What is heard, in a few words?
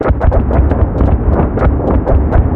plane; World of Tanks